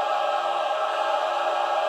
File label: choir cluster loop horror; slender